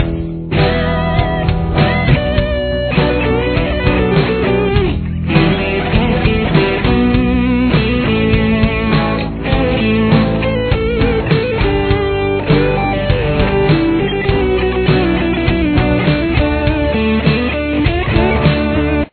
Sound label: solo